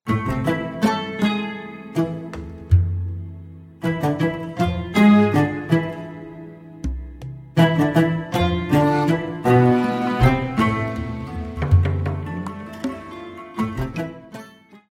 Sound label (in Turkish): santral fon muzikleri